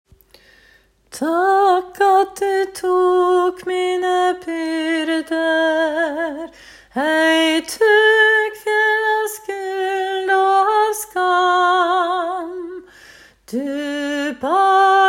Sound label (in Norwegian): sopran; åfjord